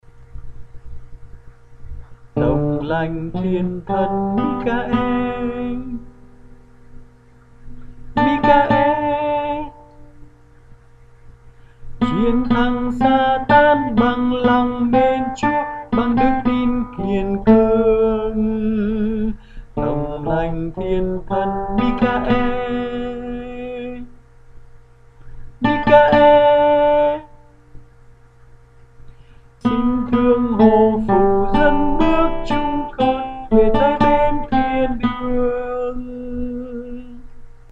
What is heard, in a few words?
Tenor Bass